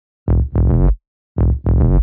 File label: 110 Fm, Melodic Loops; M8; Electronisounds